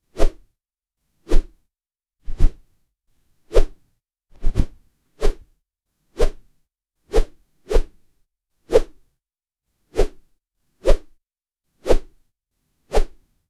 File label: multiple stripes woosh; movement